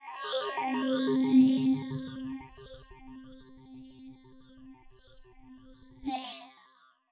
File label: 120 FX FM ambient C, Nu Jazz House; PowerFX